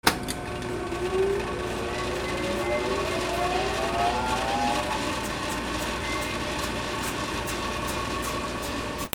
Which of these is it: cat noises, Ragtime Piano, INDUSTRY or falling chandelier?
INDUSTRY